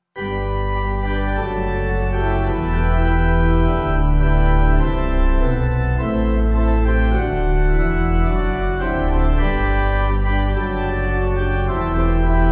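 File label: Pipe, hymn backing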